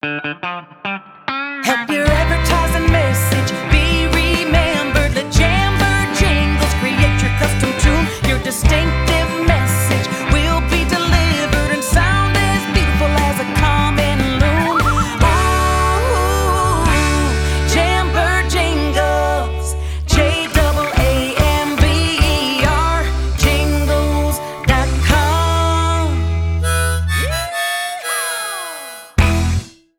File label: Jingles Country Sample